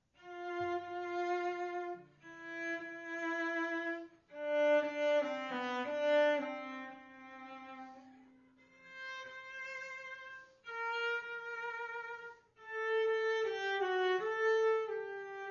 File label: cello